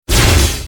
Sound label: sting effect